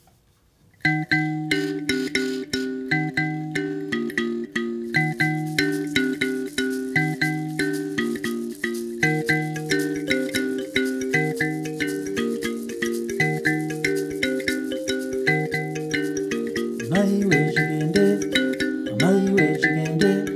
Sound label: Kalimba; Chikende